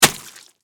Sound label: Impact Mud Hit; Miscellaneous Impact Sound Effects; Miscellaneous Impact (Sound Effects:Impacts)